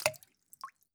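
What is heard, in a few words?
bullet case bounce 308 water; gmod; viper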